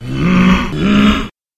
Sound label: monster storm lord